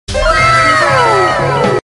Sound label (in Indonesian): notif wa lucu